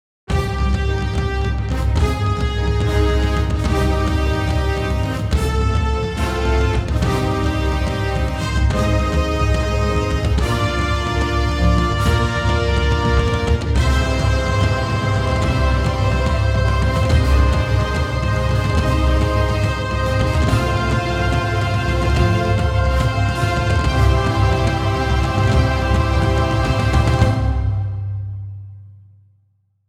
Power Drums; VSL

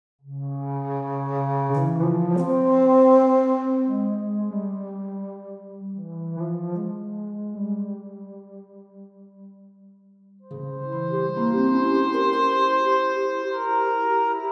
Romantic woodwinds

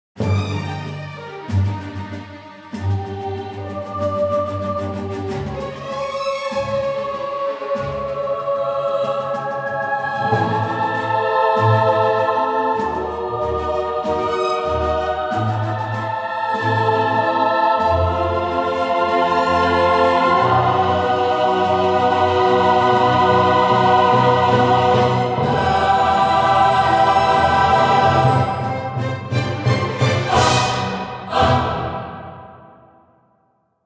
Vienna Choir

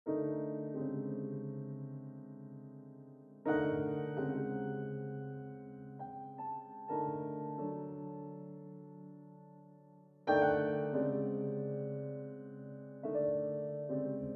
Serious variation

